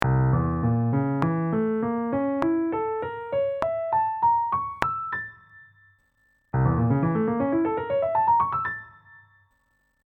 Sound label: Slow n Fast